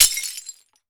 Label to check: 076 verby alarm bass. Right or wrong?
Wrong